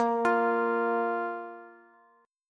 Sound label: A sharp 5th A sharp, Bass; down